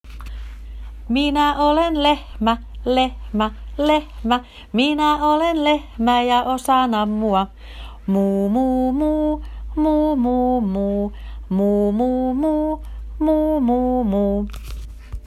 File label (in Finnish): Lehmä